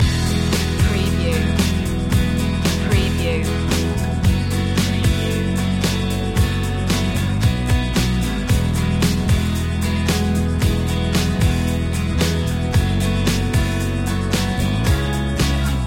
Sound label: easy summer loop